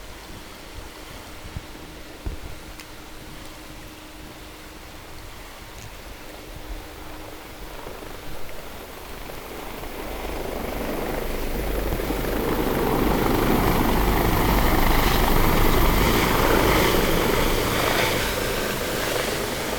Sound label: No Ship, helicopter